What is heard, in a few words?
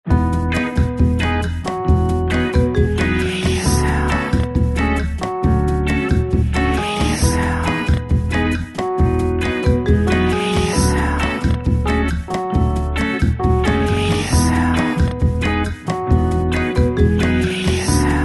Lounge Lizard - 135BPM, lounge swing